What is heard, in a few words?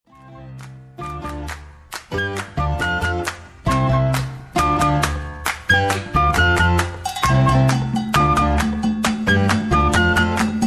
Latin Jazz; Putumayo World Music